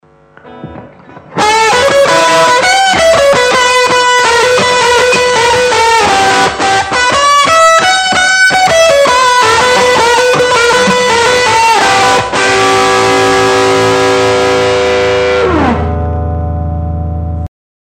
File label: Rock n Roll; BC OD-1 Demo